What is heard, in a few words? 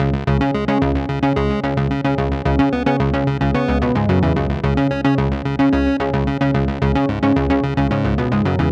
bass, one main "plucked" bass synth, and then a simple pulse wave bass that's a bit higher in frequency; rhythm-based; Rhythm Quest